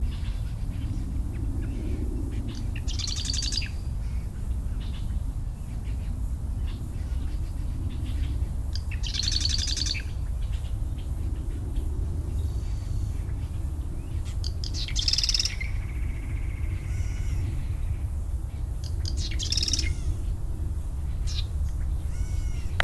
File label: marsh wren; mcginnis